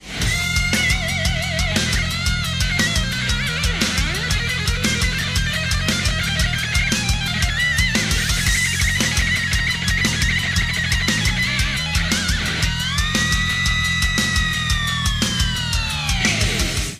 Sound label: solo